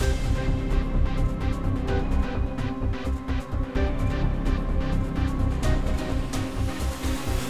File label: Mood Mysterious Heavy